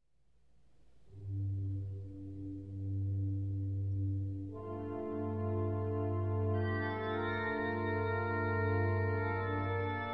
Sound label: Arabic Dance; Nutcracker